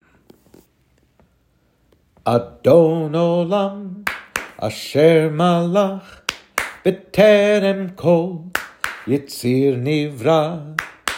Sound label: Adon Olam with Clapping